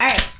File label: barrel man show; Gold Miner Joe